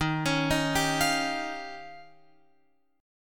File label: D sharp 9th D sharp, Guitar Open F; D#9; arpeggiated